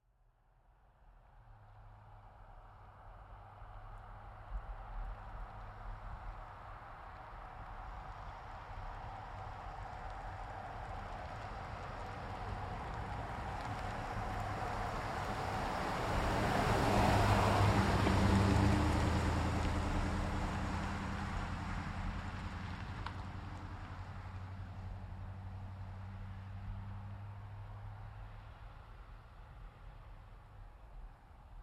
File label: Truck GMC Savana By Corner Slow; 2010 GMC Savana; Trucks; Vehicles